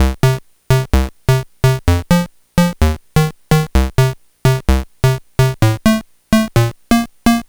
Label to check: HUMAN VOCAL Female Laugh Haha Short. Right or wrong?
Wrong